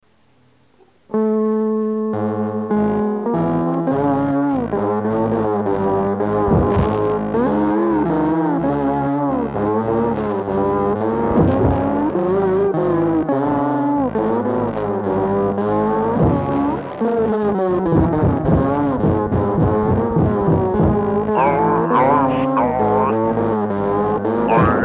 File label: Tango; Tango Demento